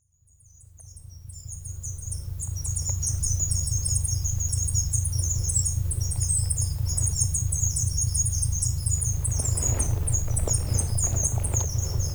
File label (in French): Oiseaux; Beagle